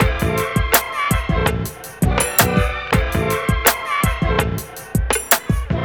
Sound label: RnB; Google; NewWave Labs